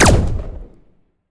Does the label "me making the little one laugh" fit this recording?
No